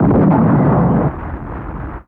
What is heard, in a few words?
POW; XXL 800 Production Effects